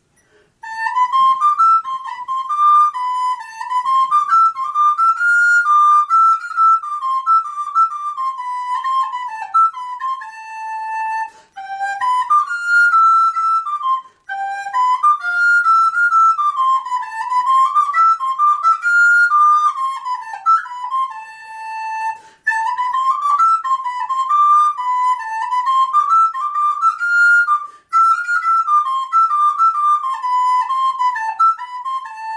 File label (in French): danse papillons, flute